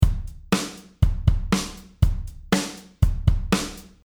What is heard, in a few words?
8 beat